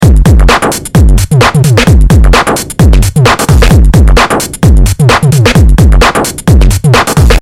distortion

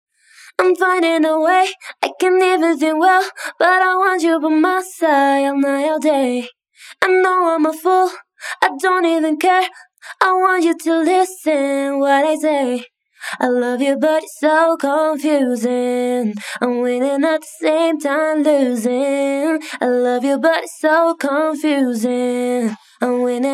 vocal de-reverb

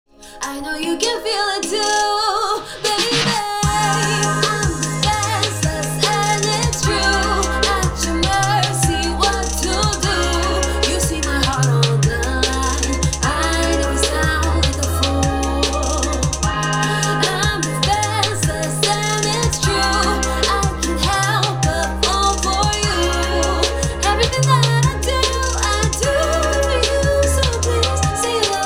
Trap

